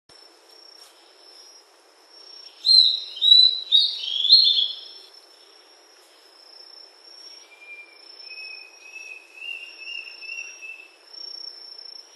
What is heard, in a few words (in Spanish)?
Mosquitero; Sendero Macuco; Misiones